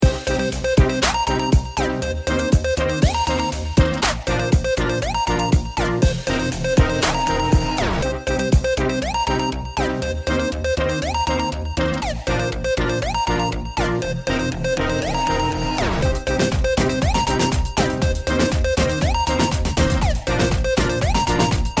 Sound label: Iphone Electro Ringtone